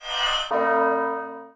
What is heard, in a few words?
Quest Objective Complete; lego ninjago rise of the snakes